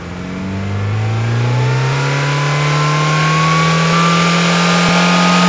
Tercel

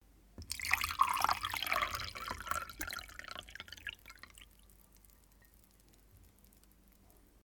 Pouring Water Into A Glass

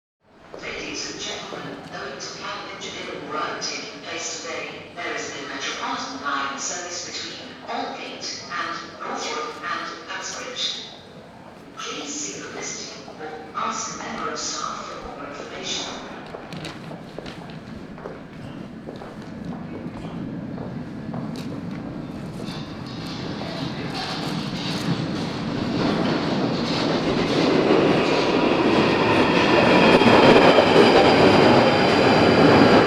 London Underground Train Arriving

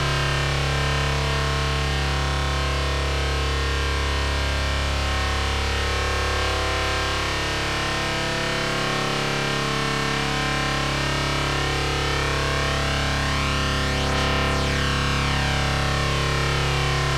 Brain Drill

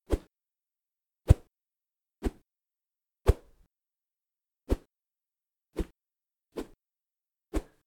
Woosh

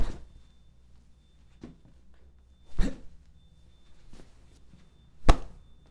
Soft Tackle

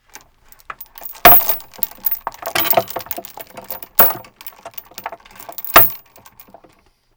Metal Chains On Wood

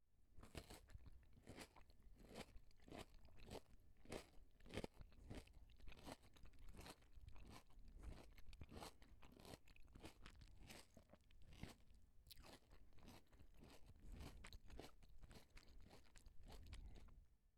Eating A Crunchy Salad

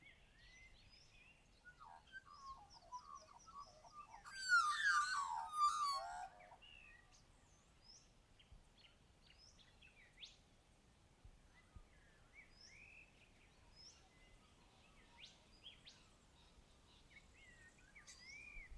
Early Magpie; Tilba

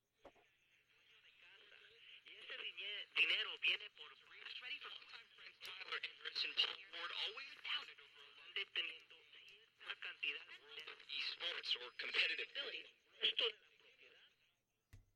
Radio Changing Channel

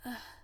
Ugh

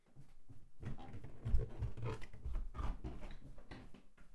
Walk Down The Stairs

